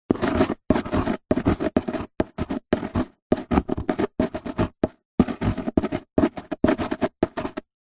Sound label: handwriting pencil write on cardboard box; office